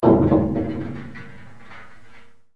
docked boat sfx

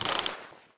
sniper military zoom; weapons

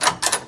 latch unlocked, doors

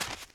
dirt, footsteps, run; boomer

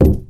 metal grenade impact soft; physics

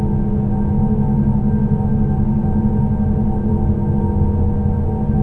airport lobby ambience loop; Left 4 Dead 2

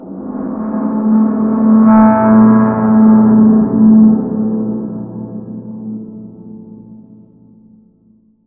rand bridge groan; random amb sounds

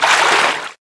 wade, footsteps infected run

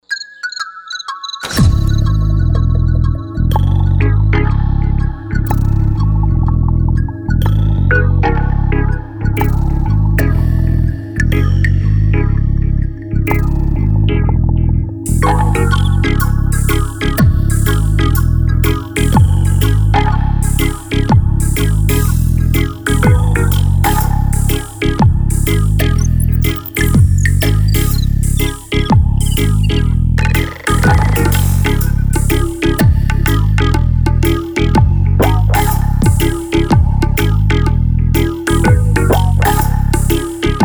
Dub Crickets; music

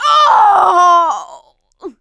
death scream; producer

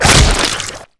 zombie slice

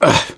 hurt minor; survivor; gambler

